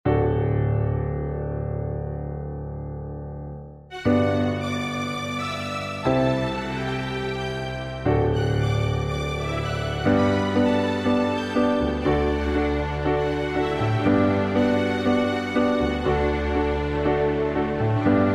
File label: musica 120 lent clasic